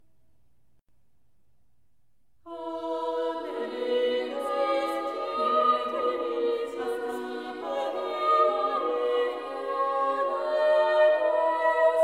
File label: Motet; O nobilis nativitas O mira dei O decus virgineum Apparuit